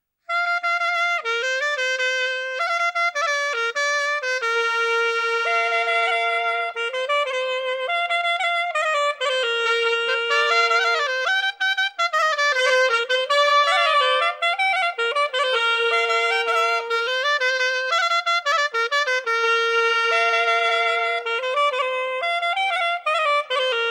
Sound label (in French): Gavotte Bal